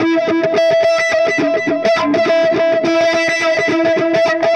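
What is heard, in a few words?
Delay Wah, Guitar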